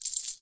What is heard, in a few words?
collect Win; jacks or better multiple hand